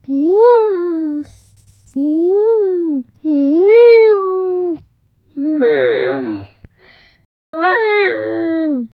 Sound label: the girls bassy voice yel, female